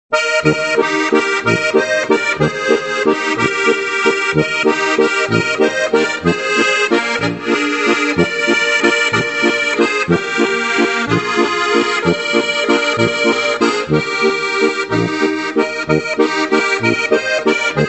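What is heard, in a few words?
monika walzer, Steirische Harmonika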